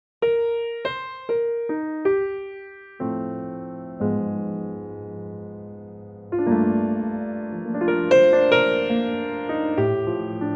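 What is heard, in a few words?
E flat piano track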